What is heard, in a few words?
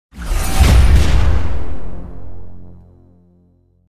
free Spin; netent; multipliermayhem